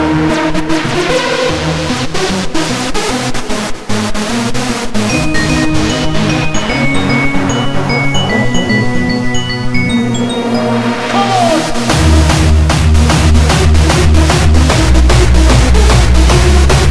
noise

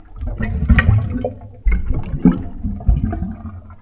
glug

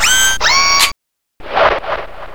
gun alt fire, weapons

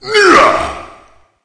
pain

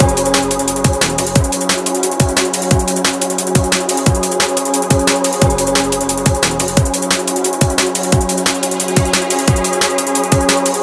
Tune

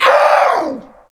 pain

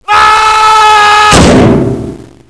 Scream

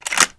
m1887 insert, weapons

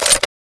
aug clip out

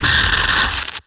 guard idle

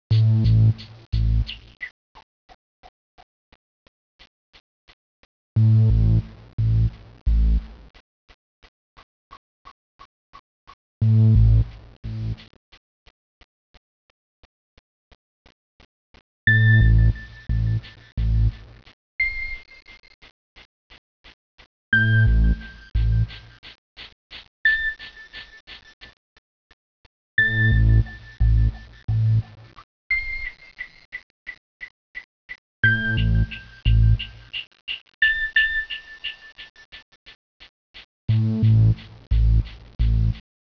ambiance

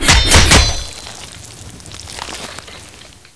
knife stab

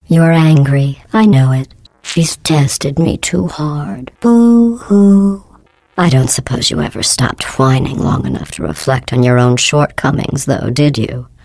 angry

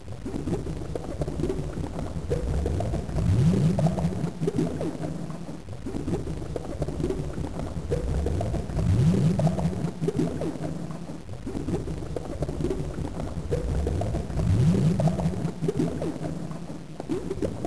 its cauldron loop

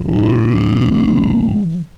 zombie die

